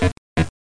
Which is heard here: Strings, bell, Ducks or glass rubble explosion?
Ducks